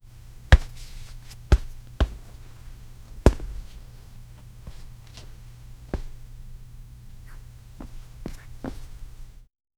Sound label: putting on shoes sound, footsteps